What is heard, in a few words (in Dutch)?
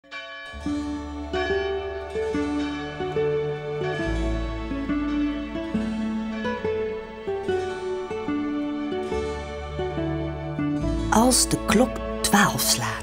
Gitaar Concert